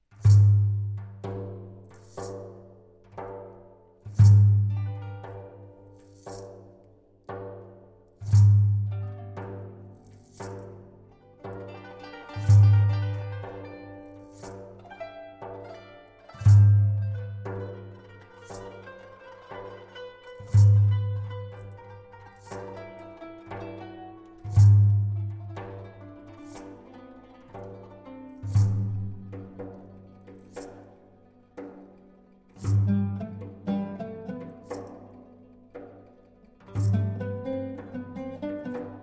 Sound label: Ouverture; Goldberg Variations, BWV 988 No. 17, Variatio 16; a 1 Clav.